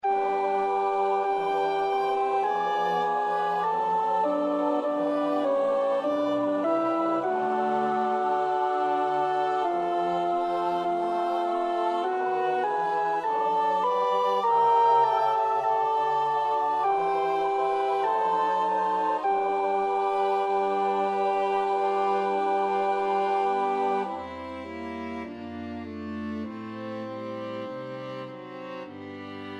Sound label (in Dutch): alt, koorpartij oefening; Reger (Max); Meinen Jesum laß ich nicht